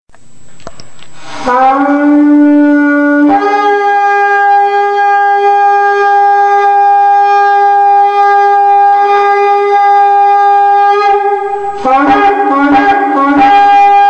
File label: Shofar